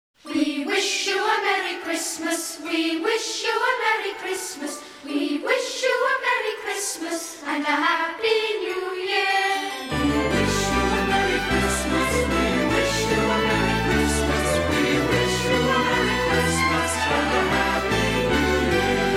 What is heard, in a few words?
We Wish You A Merry Christmas